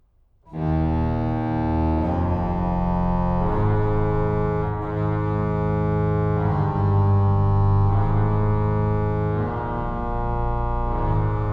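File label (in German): Pastorale, con moto, Orgel; Sonata Nr. 4 G-Dur op. 88 - I Patorale, con moto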